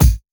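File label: KICK, DRUM KITS; InVision Interactive; 40 Oz Phat Slammin Hip Hop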